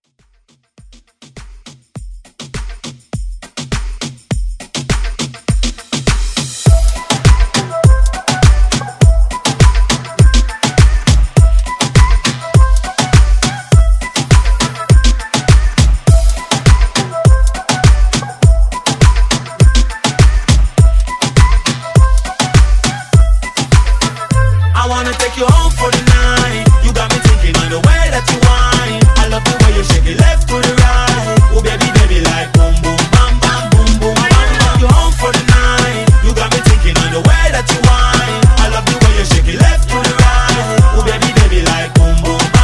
Moombah Redrum

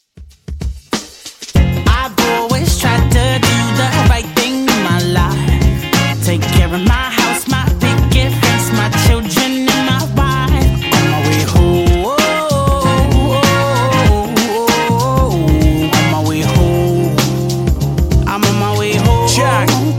Funk Intro Outro 96 Bpm; Clark Griswold

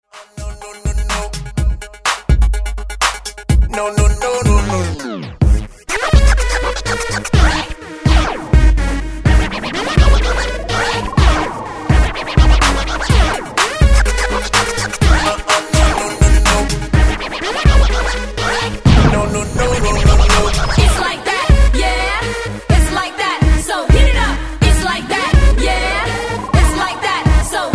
Scratch Re Drum 128Bpm; DJDOLLS

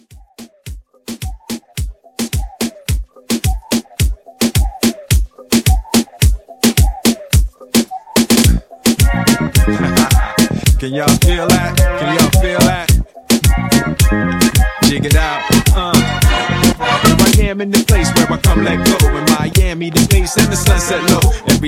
Moombah Mix 108

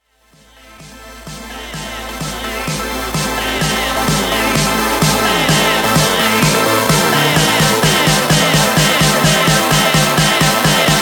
Epic Bigroom Mashup Party Mixes 128 Bpm

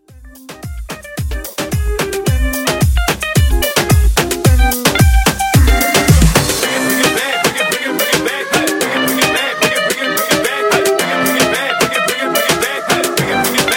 Party Moombah, 110 bpm

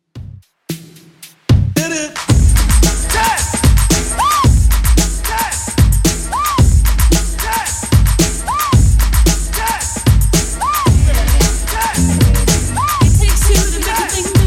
80s Redrum 112 bpm

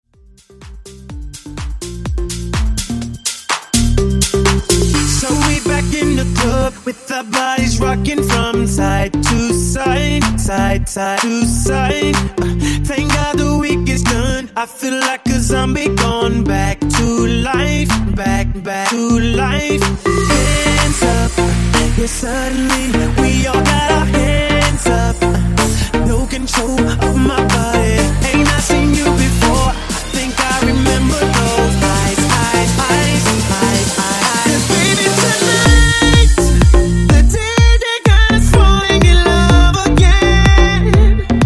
Deep House; Mashup

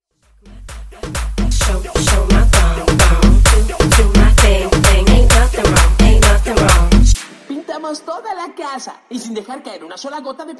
Guaracha House 130Bpm

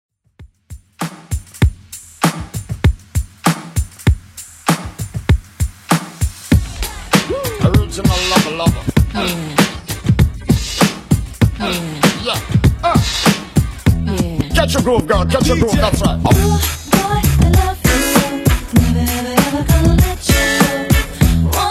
90s Simple Redrum 98bpm

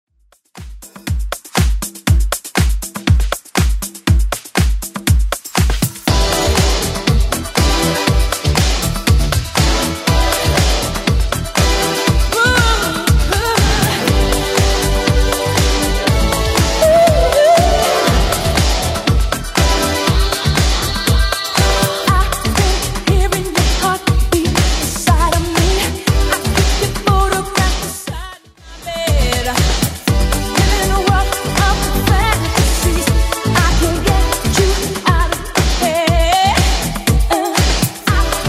80s Club 120bpm

